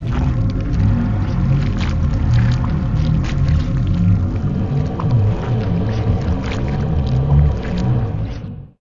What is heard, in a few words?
Entity Idle; Sid Meier's Civilization 4 Complete; Beyond the Sword; Units